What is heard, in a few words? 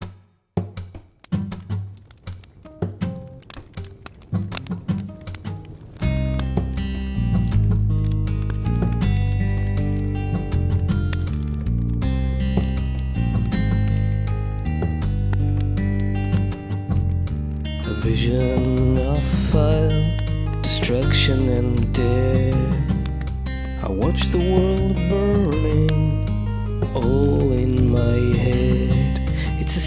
acoustic, music